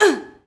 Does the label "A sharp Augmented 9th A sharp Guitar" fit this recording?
No